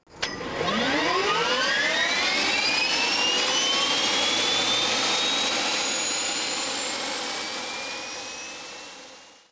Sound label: TC Spoolup, aviation; Twin Commander Start-up; A JetProp with TFE331-10 engines starting!